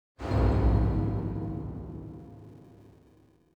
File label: metal thump, cargoliner; Soldier Of Fortune II Double Helix; XBOX; ambience